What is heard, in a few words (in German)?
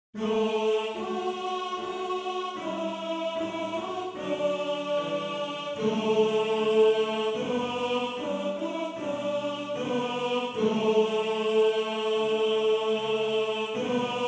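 Hör in den A Tenor 1, Männerchor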